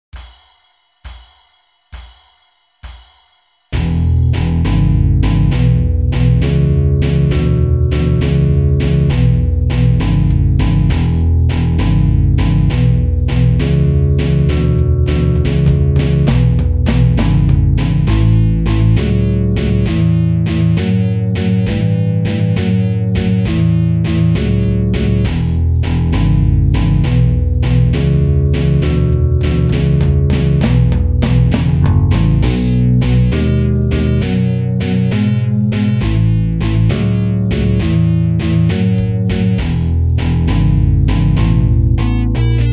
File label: Blues Shuffle Drums Bass Guitar